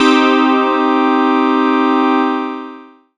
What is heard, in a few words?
pad, Dance Synths Break Pads